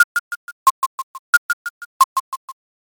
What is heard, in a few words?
ticktock